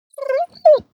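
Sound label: animal dog German shorthaired pointer whine trill; gsp; smartsoundfx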